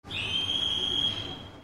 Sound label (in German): pfeife